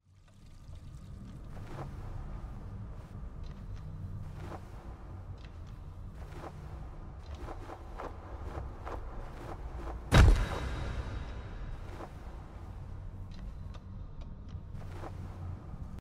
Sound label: echo; gris